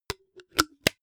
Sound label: coffee tin close